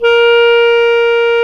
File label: WND CLAR2, Clar Jazz